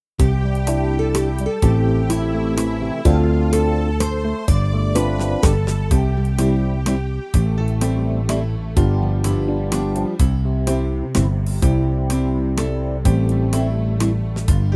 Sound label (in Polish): promyczek milosci instr